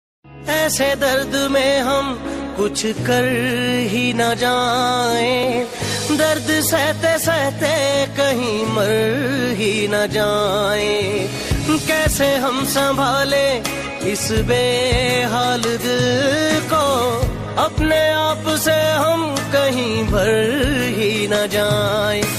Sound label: pakistani ringtone